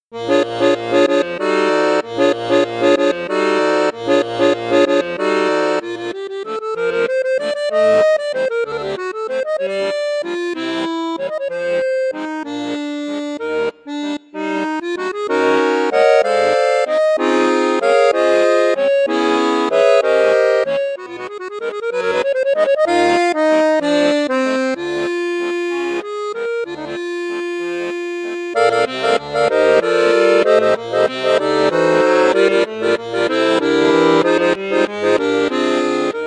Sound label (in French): registre basson2, accordeon; cavagnolo vedette 10